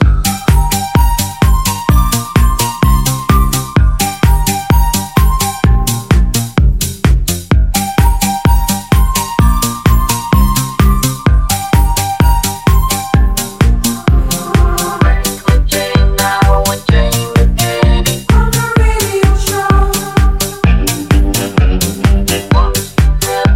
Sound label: 80s Redrum; Remixfreakz